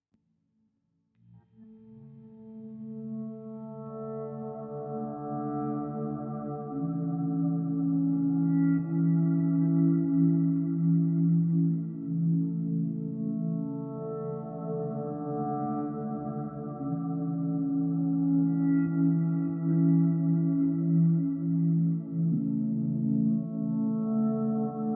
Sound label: Slow + Reverb